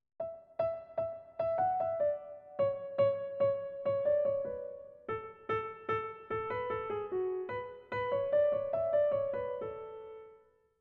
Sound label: motives; 07 - A Major 3/4